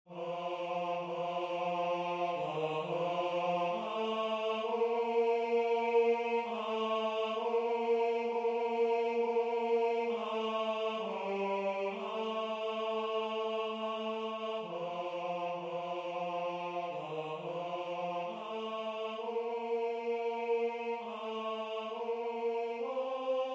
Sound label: Tenor; BATELTXOA